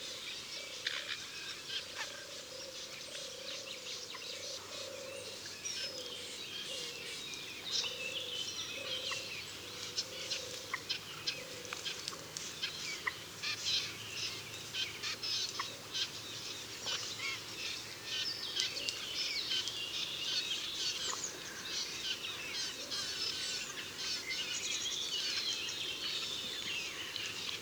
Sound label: nature; OpenBVE; Railway; SWISS2